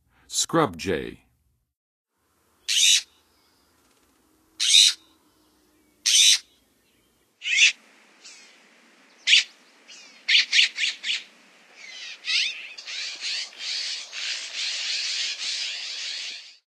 Scrub Jay; North America; Western USA; Western USA Songbirds

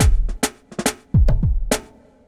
eastcoast shuffle 105

